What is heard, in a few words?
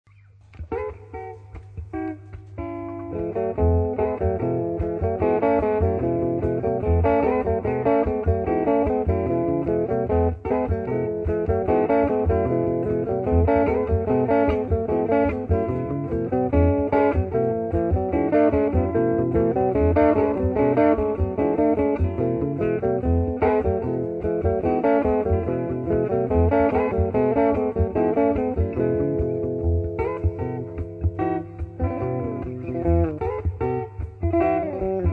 4 part harmony